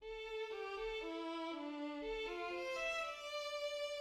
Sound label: String Quartet motive; Ravel